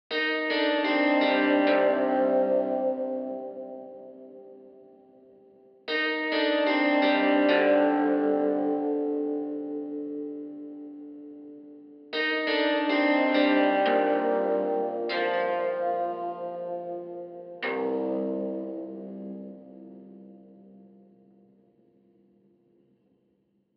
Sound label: harpy-chords